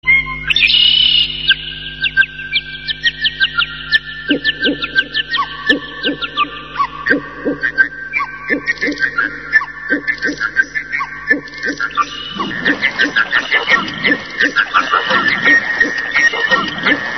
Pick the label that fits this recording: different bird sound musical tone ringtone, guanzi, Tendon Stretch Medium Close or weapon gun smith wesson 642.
different bird sound musical tone ringtone